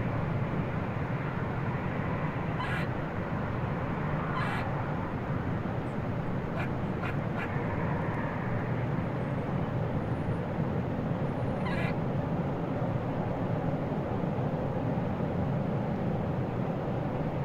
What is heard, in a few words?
squirrel outside my window